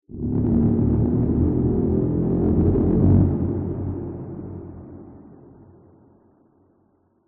horror accent growl distortion ascend low reverb ominous threatening; sinister; Skyclad Sound